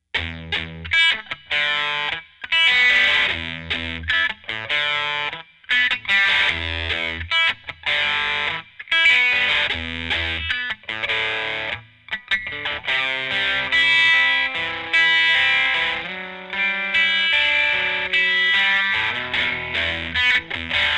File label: fender dist; Candy